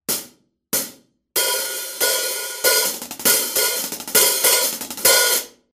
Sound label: Paragon Hats